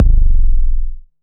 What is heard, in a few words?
808 BD long, Roland-808 Drum Hits Bass Drum